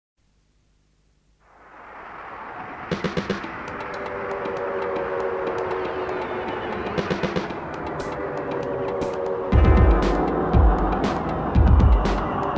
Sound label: Noise for frying oil; Astron; Lost sound system